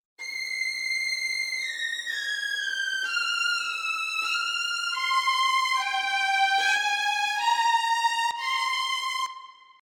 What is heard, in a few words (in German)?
String Ensemble Violinen hohe Lage